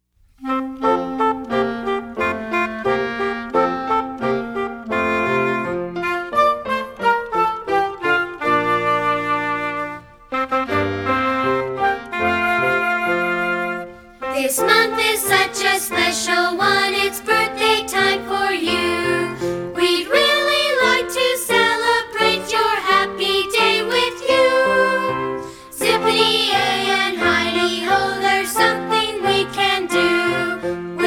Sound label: words and music